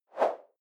whoosh simple short airy high

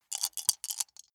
small four rattle in hand closed short; wuerfel

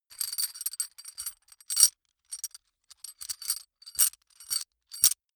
metal chain rugged handling

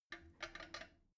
drain valve lever handle soft, badezimmer; haus garten

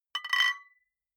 flip-top bottles clink multiple; berufe

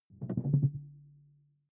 sci-fi alien tech dull; swooshes-swishes

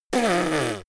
little girl snort; soundpool

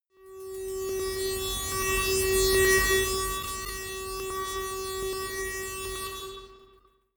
tonal flyby, sweeps swells; comedy-cartoon; soundpool

